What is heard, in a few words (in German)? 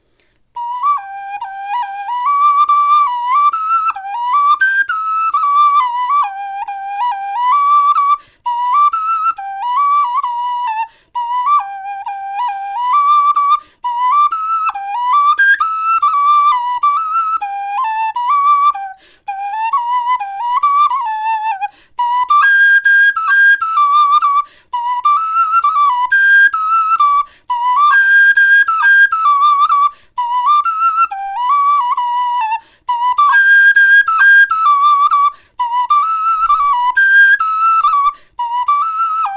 melodie, knochenfloeten